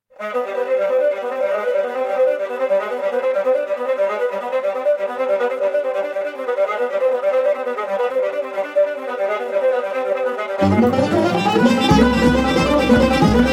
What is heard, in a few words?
Chaharmezrab